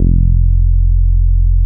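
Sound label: NORD MP F2, bass; NORDY BS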